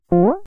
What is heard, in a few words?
phase Jump; kenney digitalaudio